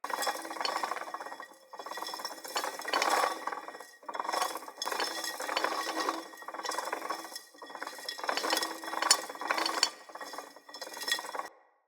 Rope Hook Squeak Loop

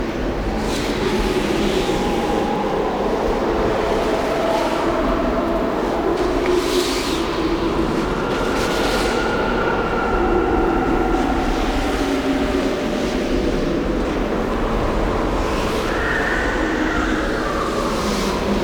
Shipyards Exterior Movement Ambience; Warframe; Grineer Shipyards

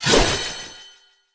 Saw Dagger Throw Perfect; Weapons; Player; Swords

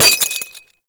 Glass Bullet Impact; Ambience; Props; Warframe; Nineteen Ninety Nine

